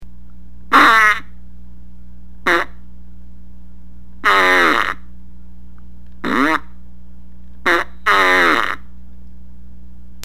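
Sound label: fart machine, a wonderful collection of four distinct, yet mesmerizing farts; There's nothing funnier than a well placed fart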